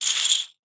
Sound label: chips